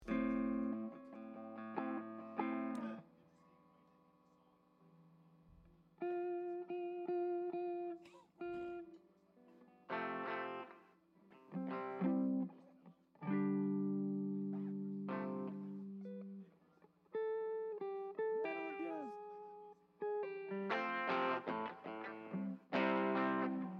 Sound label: Strings Mix